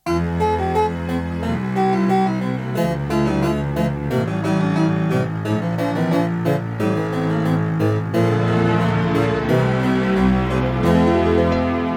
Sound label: Baroque Ensemble